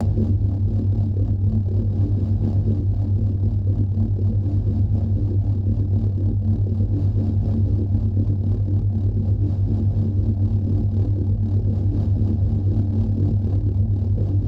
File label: neutron windup layer; apocalypse